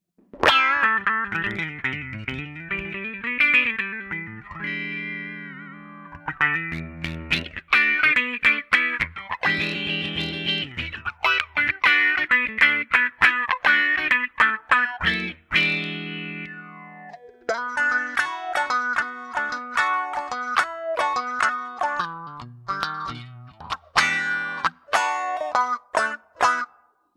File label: autowah; magix; packplug